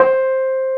AMB ROAD, Keyboards Old School; USB Soundscan vol.09; AKAI

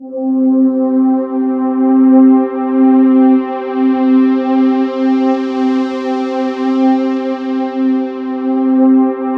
C4, Synth Leads

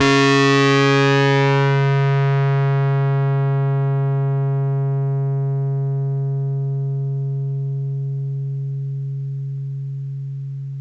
Rhodes fuzz; EP FX; PlatinumPhattCD2; E-MU Producer Series Vol. 8 – Platinum Phatt

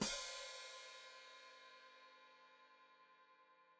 Hat Closed Open, Hi-Hat

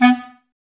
Clarinets, Wood Winds

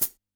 HHCL, Yamaha DD-65, HH

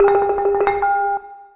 XILO, PERC LOOP; USB Soundscan vol.60; AKAI

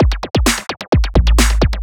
130 BPM, Drum Loops; Zero-G; Club Techno; Drum Set

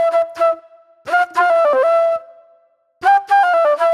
Movie Flute

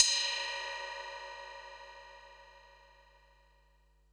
HH, Pearl SC-40 Hihats and cymbals

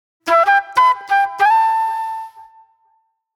Sharp Delay Flute

